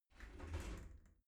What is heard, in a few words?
shower slide door slide close